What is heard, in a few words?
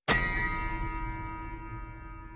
low honor button